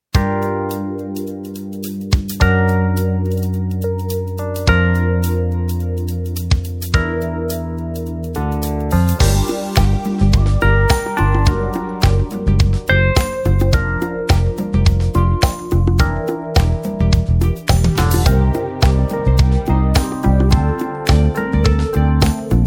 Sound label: Funk